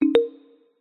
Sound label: alert